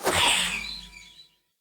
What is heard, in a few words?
Rain Rune Projectile Whizz; Runes